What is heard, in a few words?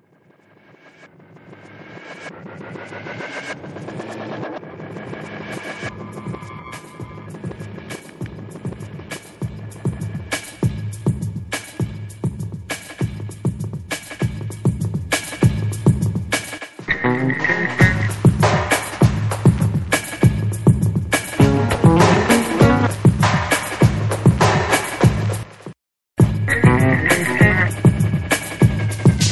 little noises; phonoroid